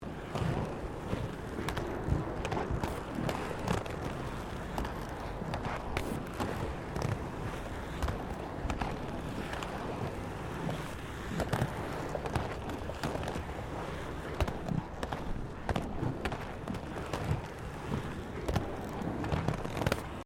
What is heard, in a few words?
ROLLERBLADING PAVING MIXED MANEAUVERING; Sports